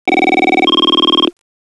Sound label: ring pattern